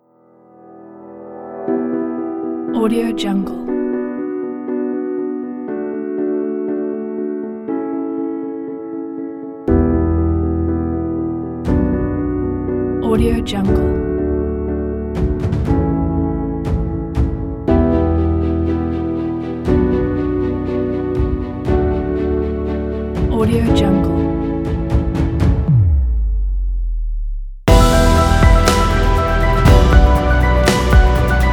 epic kit

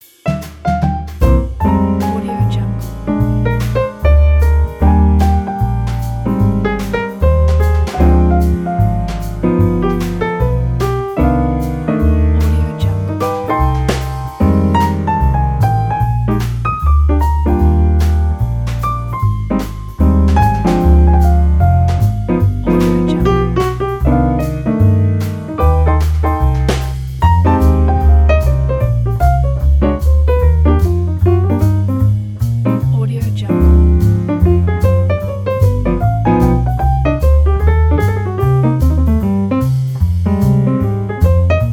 holiday romance; pressmaster

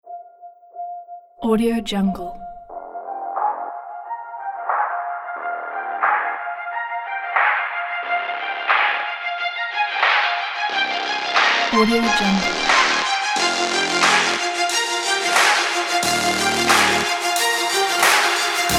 epic dramatic sport action adventure kit

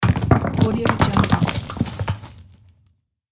rolling stones and debris

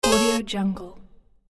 retro game jumping character

